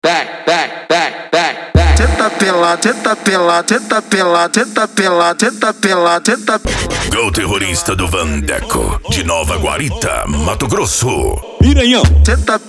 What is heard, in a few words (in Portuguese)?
dance e funk mega bass